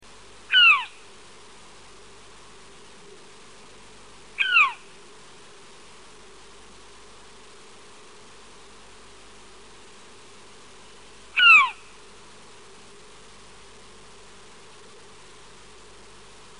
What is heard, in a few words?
owlet-nightjar australian, bird calls